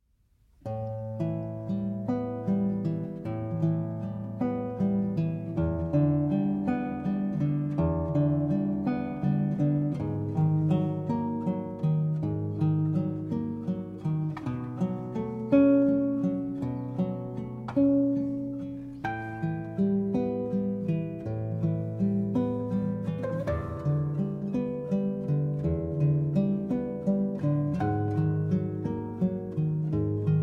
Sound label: Suite II. Movement (Arr. For Guitar)